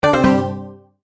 multimedia tone end

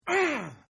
Grunt; Grunts; Voice; Partners In Rhyme

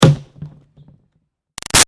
Impact Green Garbage Pail Hollow, plastic; Materials

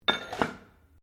METAL ON GRAVEL IMPACT GENTLE; Materials

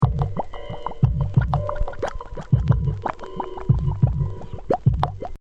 Hip Hop Drum Loop 90 Bpm Sparse With Vinyl

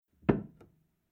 Upright Piano Opening Lid; Piano Sound Effects

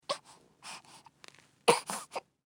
Human Baby Fuss Short; Babies (Sound Effects:Voice)

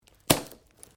Branches Dead Snap

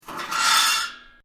Metal plates scrape; Materials; Foundation

